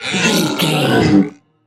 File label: troll monster death croak